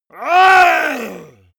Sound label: monster growl attack throaty; schreie